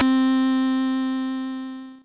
synth, amiga med

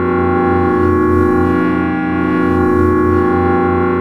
NOISE, TRANSWAVES; Soundengine - CSR-000 The Art of Transwaves

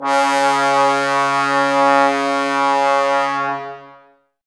Brass, Woodwind Brass Ensemble

